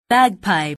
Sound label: bagpipe